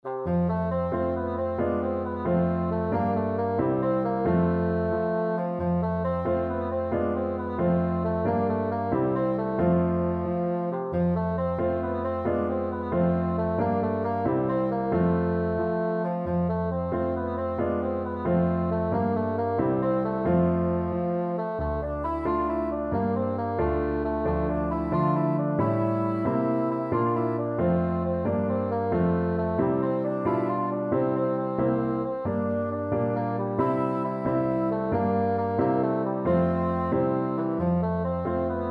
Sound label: bassoon